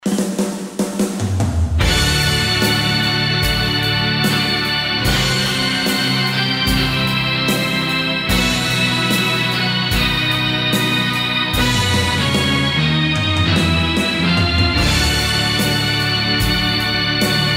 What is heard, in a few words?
Electro-Harmonix MEL9 Tape Replay Machine